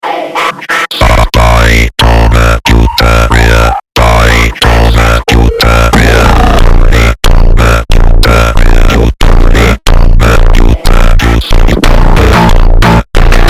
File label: Phonk Slowed